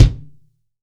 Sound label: TUBE KICK